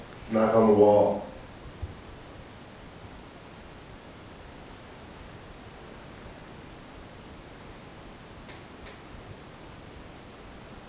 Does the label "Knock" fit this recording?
Yes